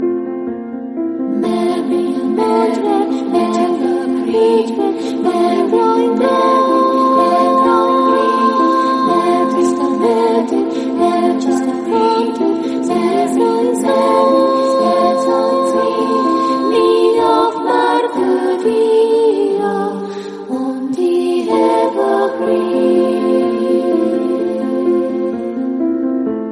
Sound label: classical chorus song call notification tone